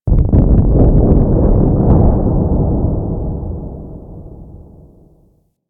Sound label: Fortress Distant Asteroid Impact; Ambience; Warframe; Grineer Fortress